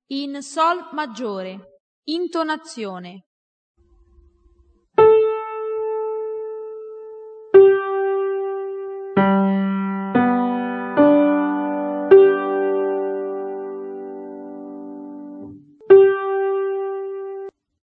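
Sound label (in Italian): intonazione Sol Maggiore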